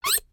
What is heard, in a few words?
Broom Bot step, creatures mechanical